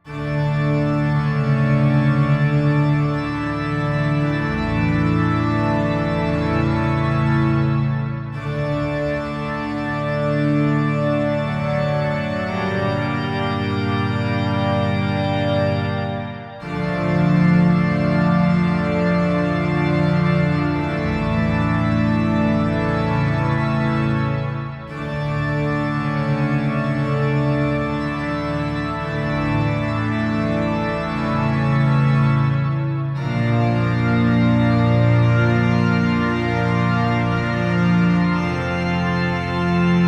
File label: Vendor Cue; Tau; Triad Vendors; Triad Vendor Roathe